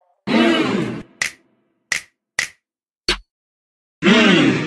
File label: mmmmm snap